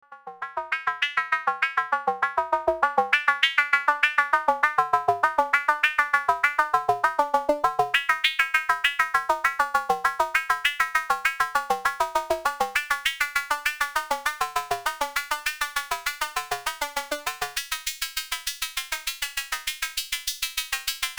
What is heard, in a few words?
Model 15 VCO; PLAN B analog blog